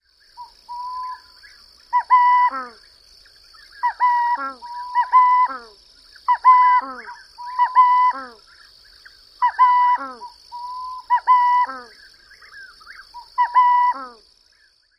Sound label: coo-coooo calls two males